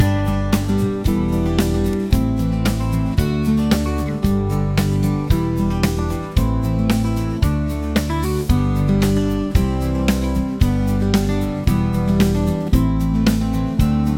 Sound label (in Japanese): アコースティックギター 幻想的